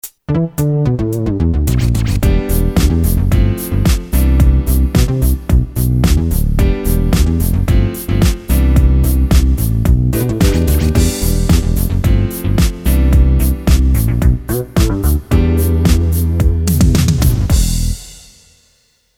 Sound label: Bass to Dance